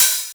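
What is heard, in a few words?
Hi Hat Open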